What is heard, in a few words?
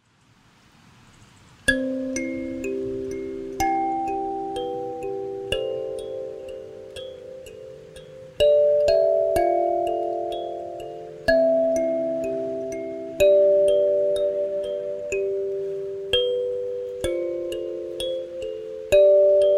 on a Kalimba